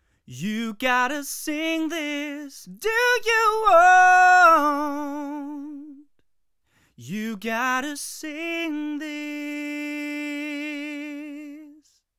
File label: ART Tube MP Neutral